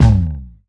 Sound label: Not Enough Coins